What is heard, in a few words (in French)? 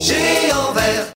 Sonnerie